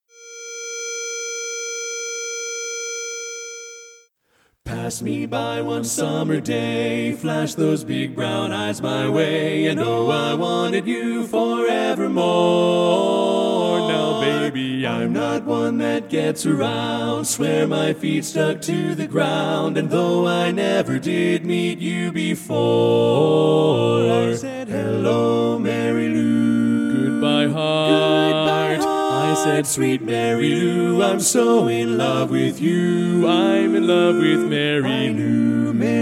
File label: Lead missing; Polecat II